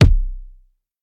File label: wet kick single shot c key